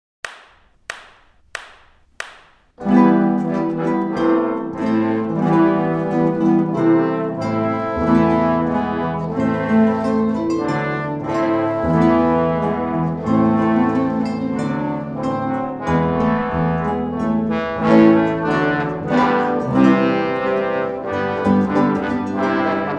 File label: orchestra